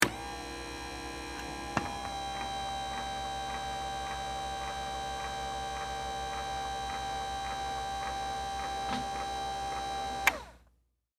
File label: Servo Motor, Hospital, Bed, Up, Feet; Motors; BlastWave